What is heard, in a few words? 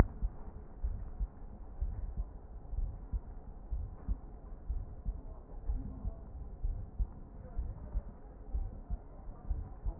A CHEST